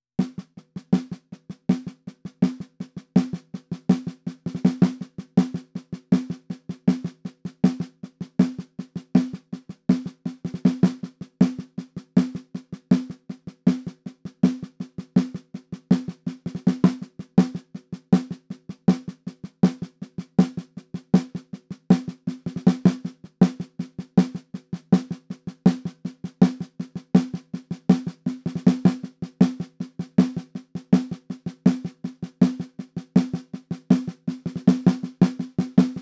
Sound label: perc intro snare